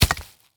Weapon Grenade Stinger Grenade Tossed And Roll In Leaves Short; AdobeCC